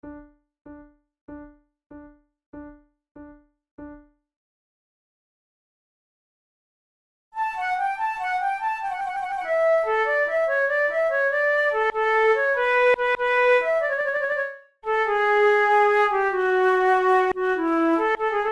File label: gigue, flute